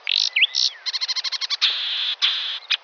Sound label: bird, ambient animal